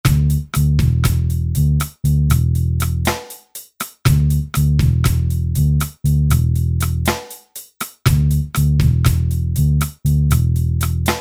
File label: Reggae